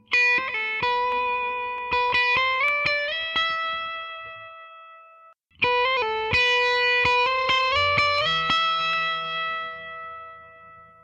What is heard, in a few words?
lead neck